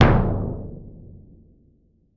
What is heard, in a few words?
Concert Kick; Roland BK-5